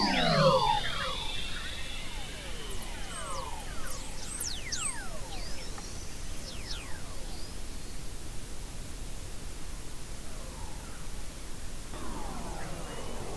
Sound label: WFR audio emfisis